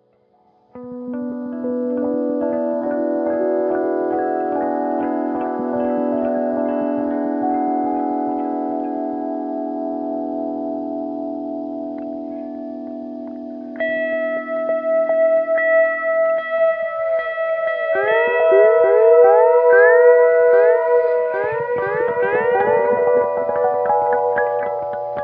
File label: G steel